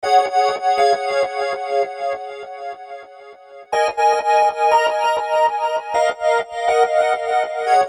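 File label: 122 C sharp Synth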